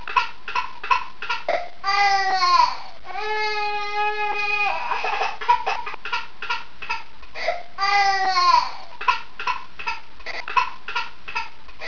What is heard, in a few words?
baby; Dubnov